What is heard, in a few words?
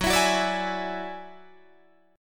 Gb 7th Suspended 2nd Sharp 5th, Guitar, Drop D